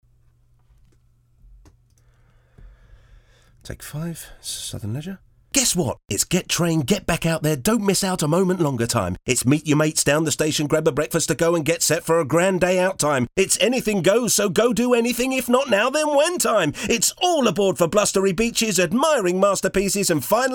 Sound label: GTR